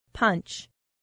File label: punch